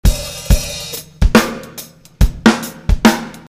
drum groove

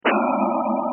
noiz synth, sfx drumkit

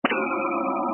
noiz synth, sfx drumkit

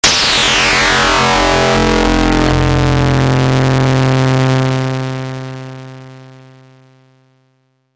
eat that C

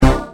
ppg bass

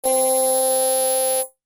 soft machine C

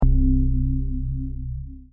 pad bass C3

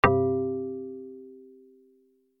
pluck C

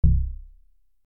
muted C, bass

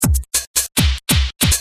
cutting, rythm loops

